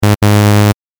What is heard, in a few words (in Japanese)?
不正解